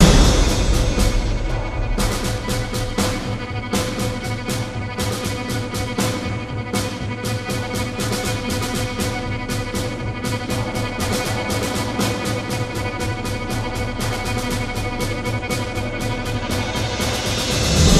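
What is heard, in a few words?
music, percussion only loop; transformers war for cybertron gamerip; MATRIX OF LEAD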